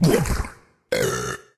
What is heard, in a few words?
Drink and burp; Level4 Barney